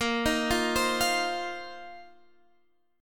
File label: Bb Suspended 2nd Suspended 4th Bb down Guitar Standard; arpeggiated